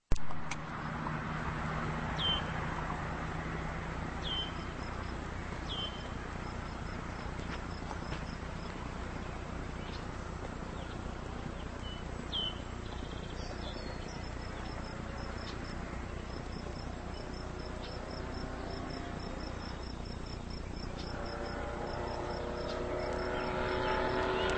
detroit 6-71 with jake brake in gmc crackerbox, ringtones